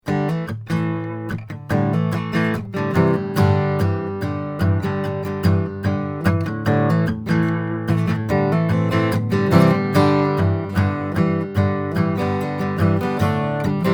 Strum Am; Neumann KM253

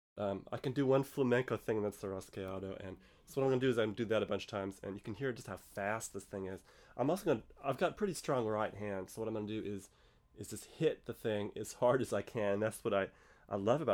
Loud; Ramirez 1970F

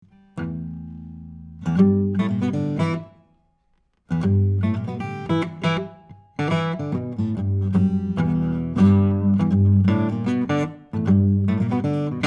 Improv 2, Guild12; Guild 12-string guitar; electric acoustic

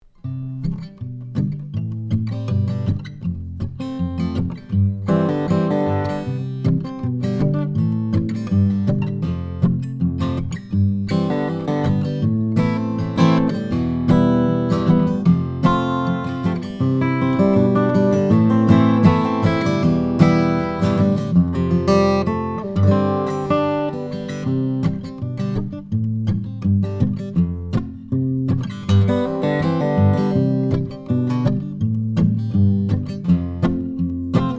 Fingerpicking; AMI V78M

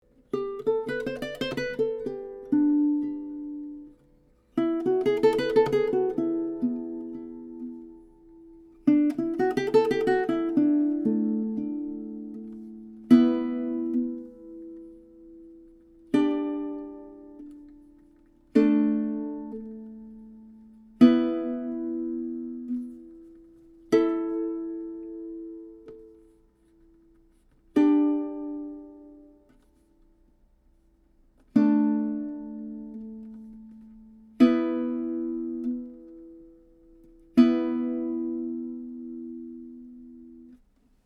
Resonance, Baroque Guitars; Resonance Test (Stopped scales / chords showing sympathetic resonance); Mazarand

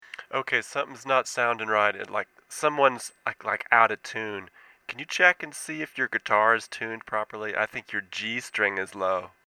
G String; Wollensak

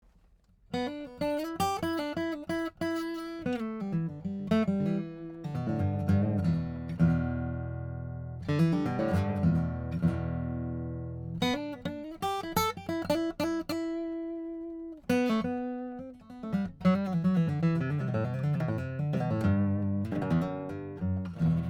Ocean Boogie, Martin D15; Original, in E; straight tone from the guitar

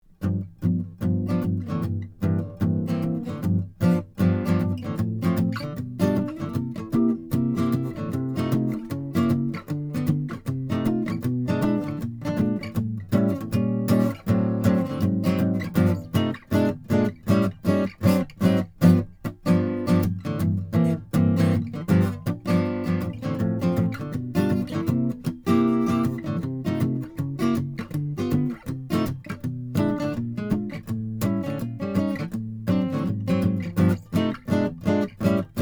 Jazz

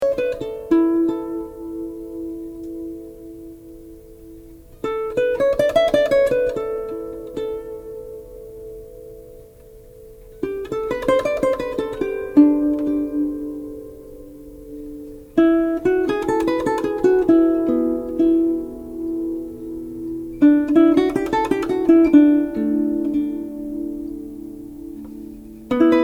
Resonance

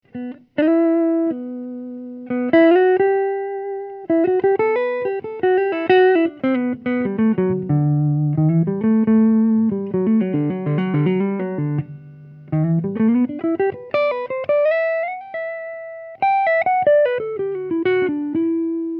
Leads; Improv; Benedetto

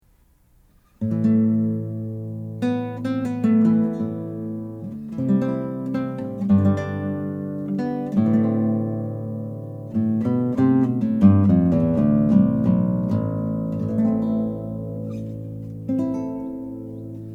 Improv Bass; Maingard

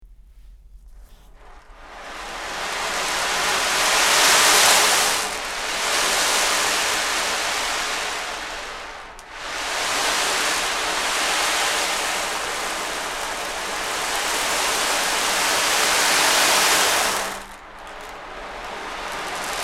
Rain

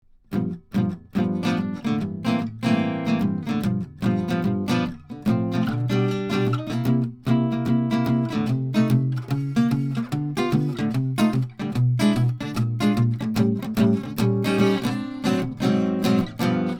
Jazz; U47 Kit ARange

